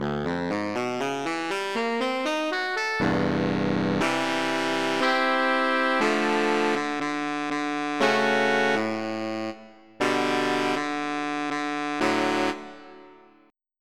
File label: Tenor Sax; EverQuest